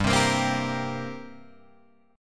G Minor Double Flat 5th, Guitar, Open G

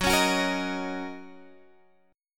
Fsharp 6th down Guitar Open G

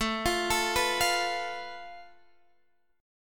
A Suspended 2nd Sharp 5th, Guitar, Standard; Asus2#5; arpeggiated; x,12,15,14,12,13; JGuitar

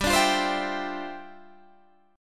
G 5th 13th G, chord

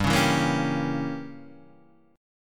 G Diminished 7th, Guitar, Standard

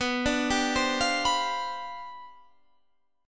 C Major 7th Suspended 2nd Suspended 4th B, chord

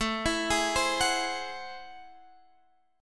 A Minor Major 13th, down

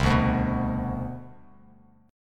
C Major 7th Suspended 2nd, C, down, Bass Standard; strummed; CM7sus2

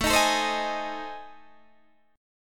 A Minor Major 7th Flat 5th, Guitar Open D

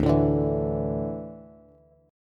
D Suspended 2nd D down Bass; strummed

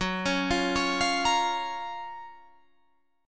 F sharp Major 7th, Guitar, Drop D

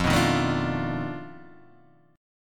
B 7th Sharp 9th Flat 5th, guitar

